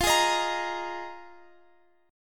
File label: F Minor 11th, Guitar Open F; strummed